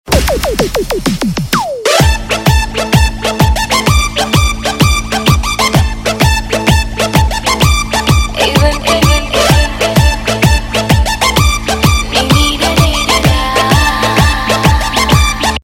Electrifying Music - EDM

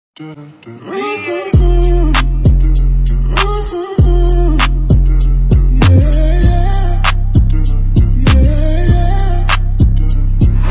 Ringtone

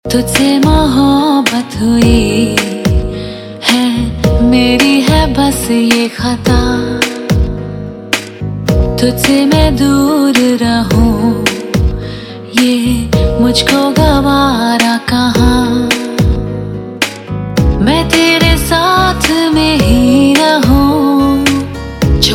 Female, Bollywood Punjabi Pop; Valentine Day Special (2015)

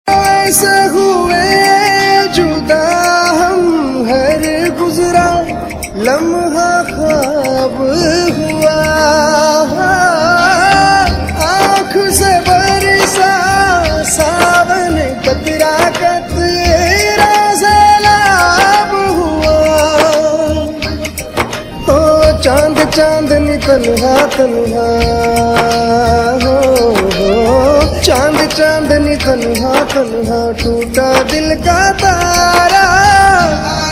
Sad Tune; TV Serial Tone; Life OK; Main Lakshmi Tere Aangan Ki

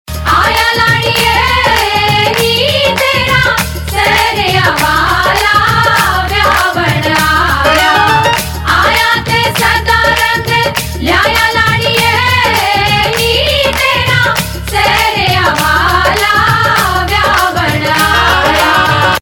Punjabi Gujarati Sagai Song Ringtone; Bollywood; Patel Ki Punjabi Shaadi

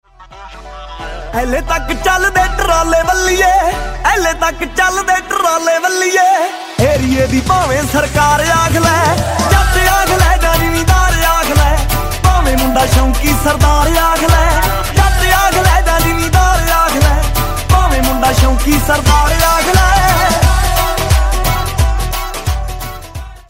Punjabi Ringtone

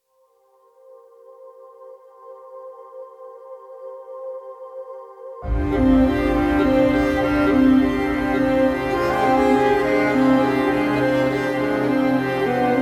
Instrumental; Madras Cafe (2013)

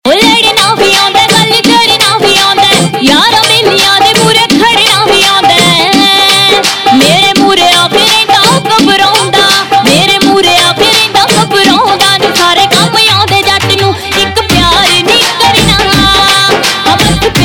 Ringtone, Punjabi

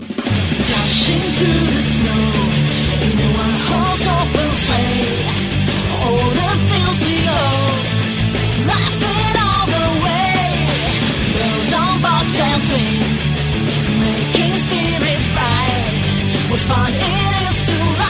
Jingle Bells

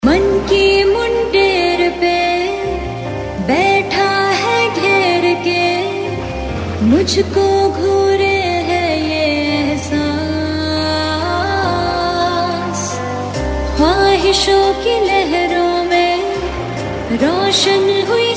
(Female); Iss Pyaar Ko Kya Naam Doon - Ek Baar Phir; IPKKND Ek Baar Phir

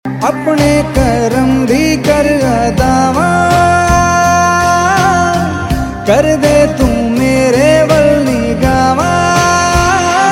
Punjabi Version Ringtone

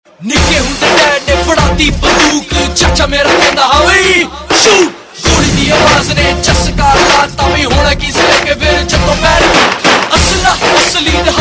RAP Ringtone, Punjabi Pop

